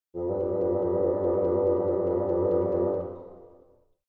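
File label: Cartoon bassoon trill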